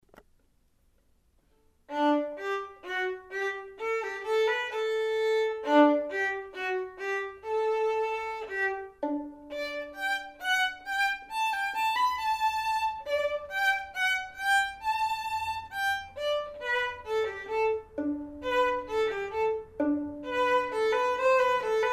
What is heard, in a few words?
vln perf